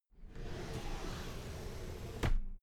drawer cabinet paper open soft

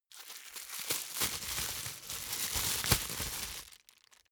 plastic bag unfold quick

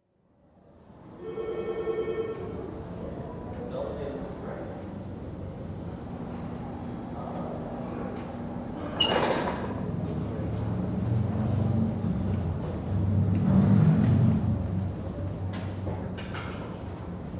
hotel lobby in usa; sheraton

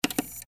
mechanical switch

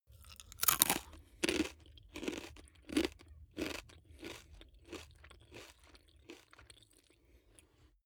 eat potato chip mouth closed normal

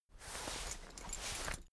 key ring small trousers pocket fetch

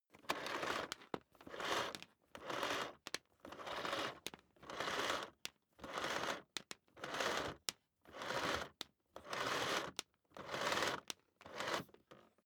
vacuum cable drag out sequence

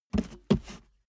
step barefoot metal scuff

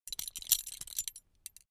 metal belt buckle clatter long heavy

